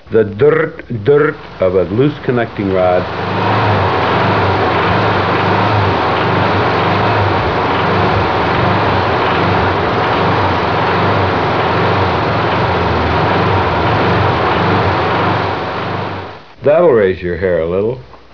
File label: loose rod; Loose Connecting Rod; Muir